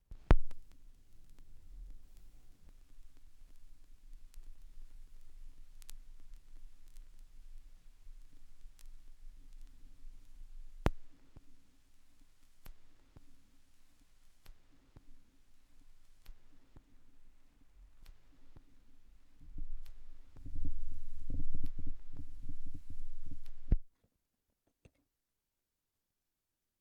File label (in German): schallplattengeraeusche